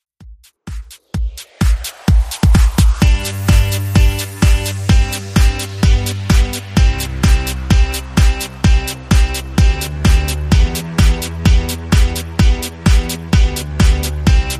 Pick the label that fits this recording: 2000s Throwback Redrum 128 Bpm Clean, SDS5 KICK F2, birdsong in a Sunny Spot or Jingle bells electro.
2000s Throwback Redrum 128 Bpm Clean